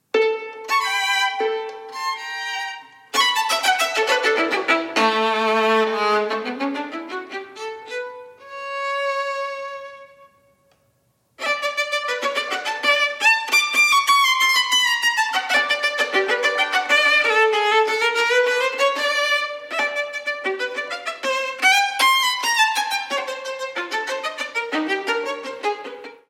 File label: theme with 8 variations for solo violin theme con spirito